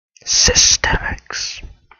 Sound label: whisper; Systemix; Signatures